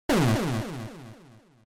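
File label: Game Boy General Pitch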